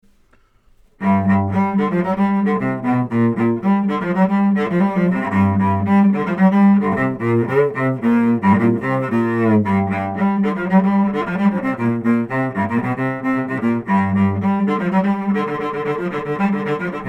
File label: Cello omni; telefunken tf47